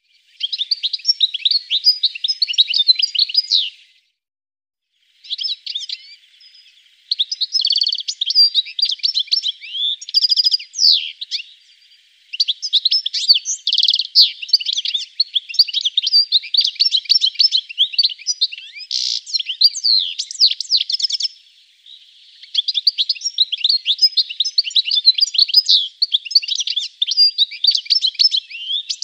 penie chernogolovogo schegla

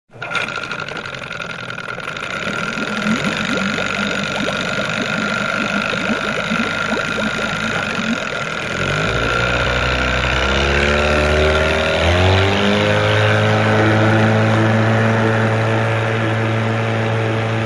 zavod motornoj lodki

